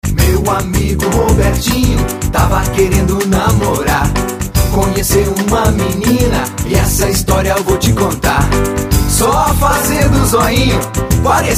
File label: jingle; ortodontic center